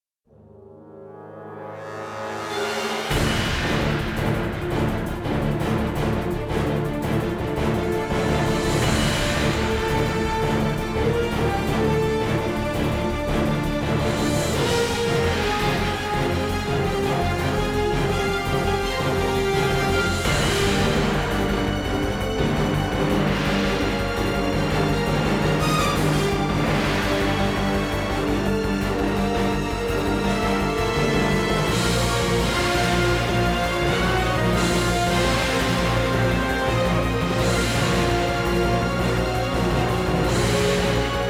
Warning!; Bayonetta 2

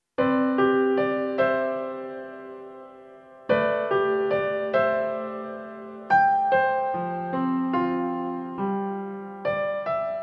Shower; Sa-Na-Ra-Ra

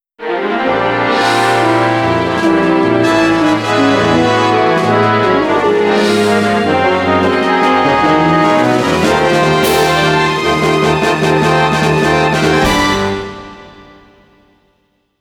Victory!; Chibi-Robo

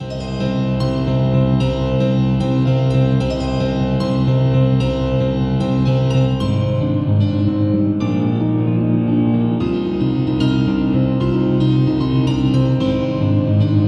Boss Battle Piano equalized; Kemono Heroes; stadia

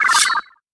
Ground sword

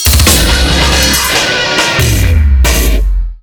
challenge complete metal

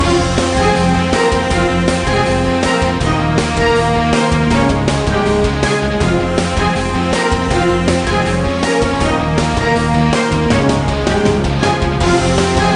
Track Music Action; Emergency 2012; NDS

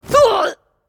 Character Ninja Hit; angry birds epic android ios mobile 2014

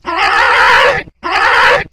kamikadze death

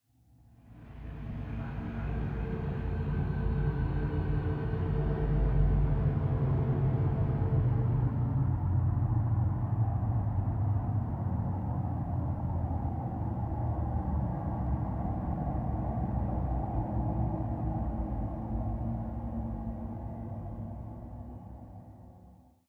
Ambient Fog Stinger; silent hill homecoming 2008 gamerip pc ps3 x360

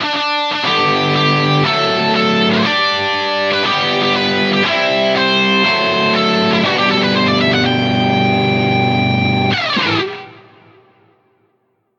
Win; Project Reality BF2 1.6

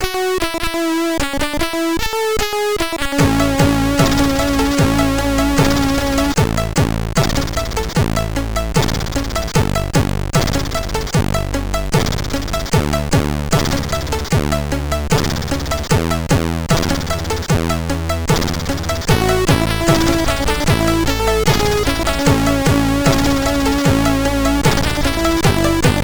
Menu Music, SID Stereo; Grand Monster Slam; Commodore 64

